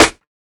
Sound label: snare sound a key, Royality free snare tuned to the A note. Loudest frequency: 2465Hz